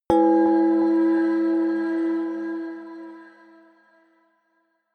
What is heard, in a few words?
synth hit music box, hits impacts; whooshes rises